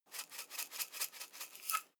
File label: herbs shake dull; soundpool